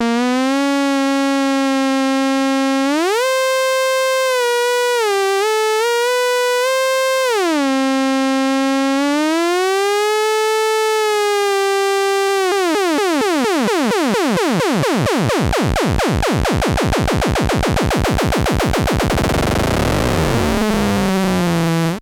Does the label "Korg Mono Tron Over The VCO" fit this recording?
Yes